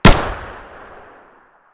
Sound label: fiveseven muzzle mono distant, weapons